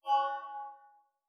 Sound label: conv bell; pad